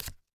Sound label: Panel Boarding Slide In; Among Us